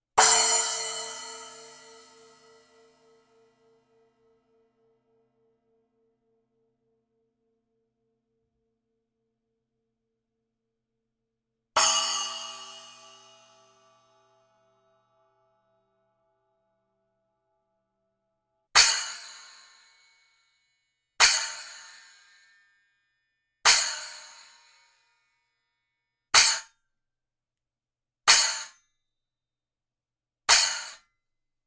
Splashes

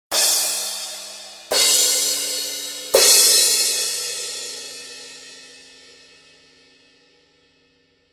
Paiste Crashes

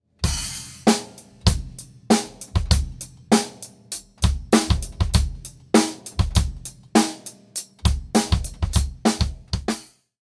Sonor Artist Steel, Snares

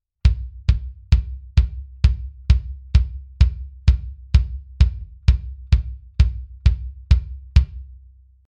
BD Mic Test RE27ND solo wet

